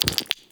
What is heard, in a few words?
gem up; gems